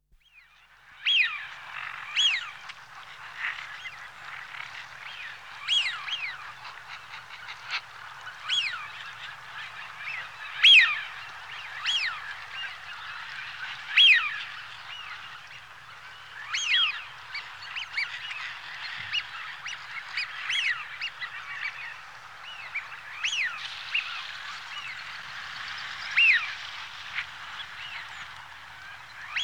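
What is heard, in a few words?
Wigeon (Anas Penelope) Large Flock; Birds; BBC Sound Effects Library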